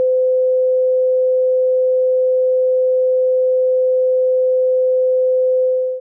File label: Broadcast Stopping